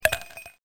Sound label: ICE